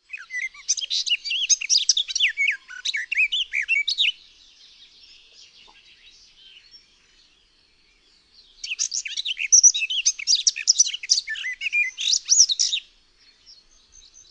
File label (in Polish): GAJOWKA, birdsounds